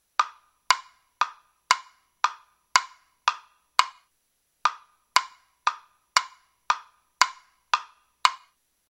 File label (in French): bloc a deux tons; instruments